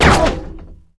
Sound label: Mimic Dmg; Seer; GamigoNA